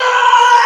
scream

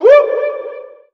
woo

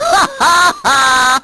laugh

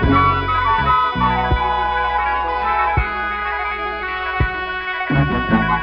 horns, Phonky loops

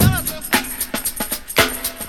thunk, drum loops, funky

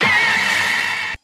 noised, stabs

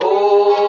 ooh, Voice

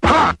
ha, Voice ooh ahh

